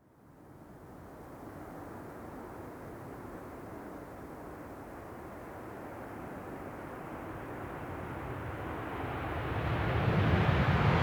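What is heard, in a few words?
Take Off CONCORD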